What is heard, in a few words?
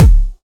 raw kick drum single shot d key, bass drum tuned to the D note